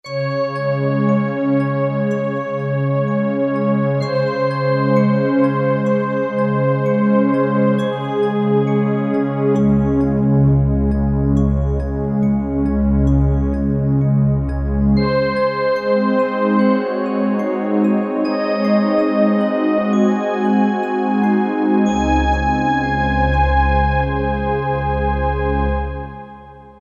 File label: Bell Pad